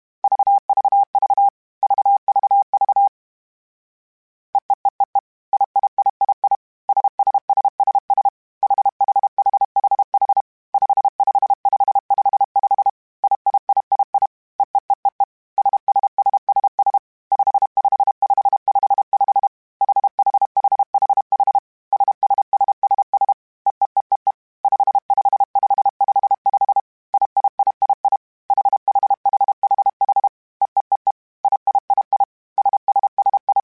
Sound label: All Groups, Morse Code; CW Code Groups; All Groups Concatinated Blocks; HamRadio; Basic Sets